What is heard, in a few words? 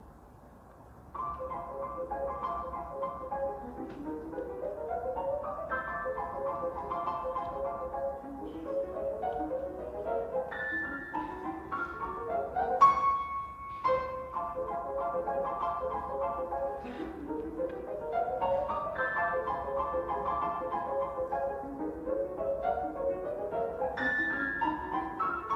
Ballet of the Unhatched Chicks (scherzino-vivo, leggiero-trio); Sviatoslav Richter; Sofia; Sofia 1958